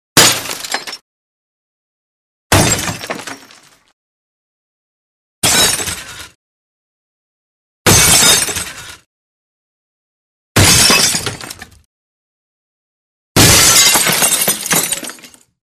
Glass Breaks Medium Medium Glass Crash With Falling; Hollywood Edge Foley Sound Library